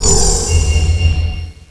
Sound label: teleport; unreal